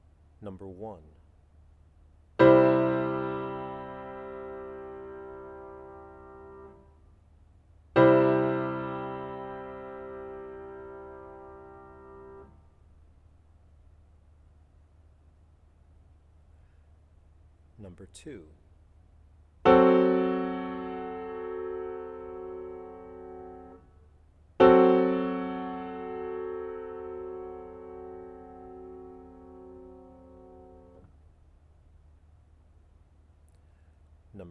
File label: chords